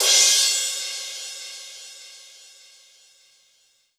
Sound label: Power Crash Cymbal; Korg NS5R